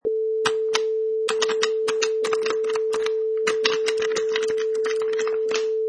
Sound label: Joystick